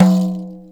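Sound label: DIRA-DUM, pitched percussion; East West - Global Sounds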